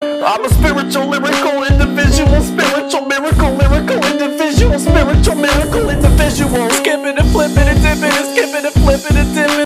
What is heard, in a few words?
real hiphop